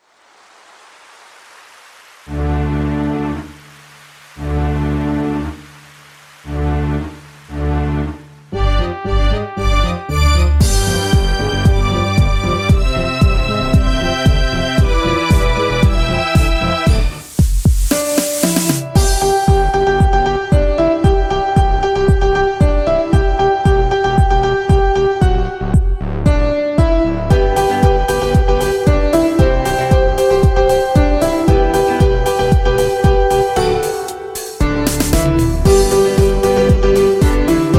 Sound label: Instrumental Piano version